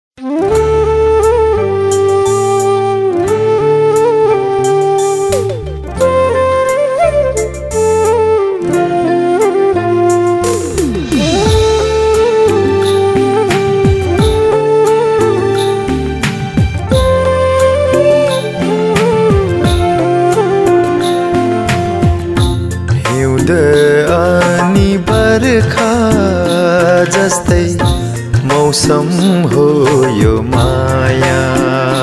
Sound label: vocals